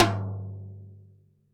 TD-20 Tom A, Roland TD-20 Toms

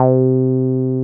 303 OW C4M, 303 OW BS; Drums In Your FACE!

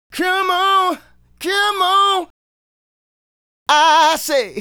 Male Solo, Hip-Hop and RnB Vocals

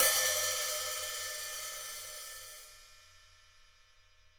HH Open

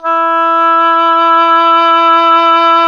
WND OBOE2, Oboe vib; Roland LCDP04 Orchestral Winds; Oboe 2&3

